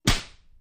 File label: Big Face Slaps Huge; flesh